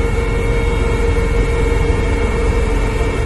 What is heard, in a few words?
inside cell max stress loop mono left, Lynx internal audio loops